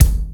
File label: trebly bass drum sound g sharp key